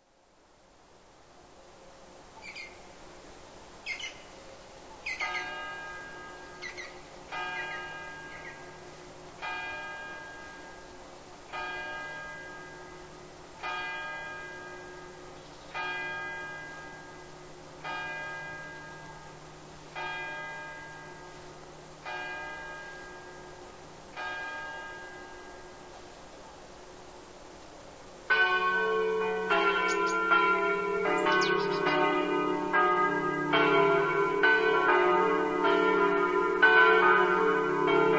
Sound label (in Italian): orsaria, campane